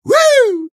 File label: taunt flip fun; engy; taunts